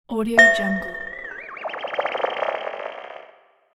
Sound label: cartoon bell slip